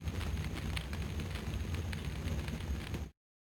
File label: Fire